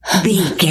voice female breath quick

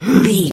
voice female growl grunt

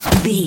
bullet impact snow

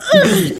voice female hurt pain

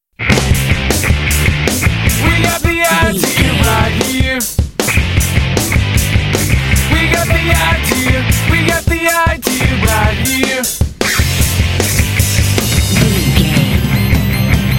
vocal

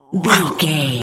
zombie voice hiss

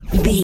ui menu button scroll back

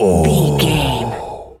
gorilla growl deep

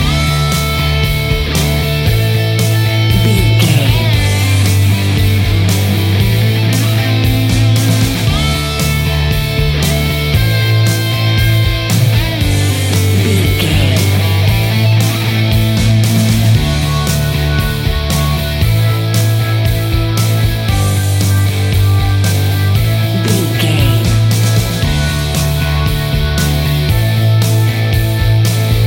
old classic rock music; Old Classic Rock 30 Sec Mix; Catch 22 Music Network

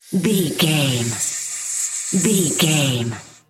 snake hiss slither

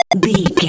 retro synth beeps groove

